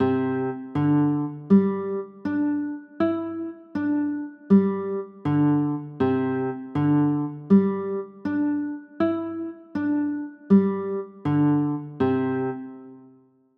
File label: c add9, chords